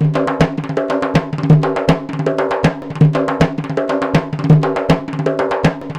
PERC LP, Arabian Traditions; USB Soundscan vol.43; AKAI

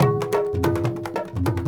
India, Heart of Asia

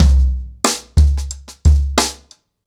90BPM, ACOUSTIC DRUMS

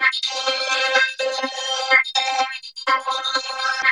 VOC LOOP, VOCODER LOOP; Zero-G Ambient 1; VOCODERLOOP1

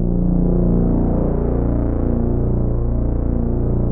Prophet 5 B1; Hollywood Edge - Giorgio Moroder Rare Synthesizer Collection

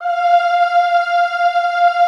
EHH F4, Classical Choir; Best Service; Extended Classical Choir; EHH MIX

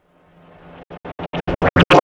Cut Fan Swell; KJ Sawka - Drum n Bass & Beyond; Swells

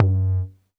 polyvox tom, Polivoks; Formanta Radio Plant

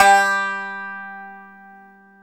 TZOURAS G 2, Mediteranean Traditions; Soundscan vol.53; AKAI

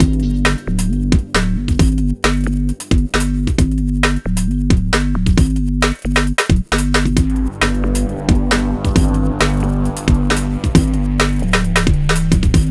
Hardcore Breakbeat, 134 Gmin; Big Fish Audio; Hardcore Breakbeat Action; Rezanator

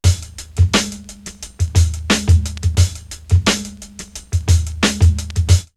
drumloops OldSchool 88; X-Static Goldmine; 90 s Sample CDs

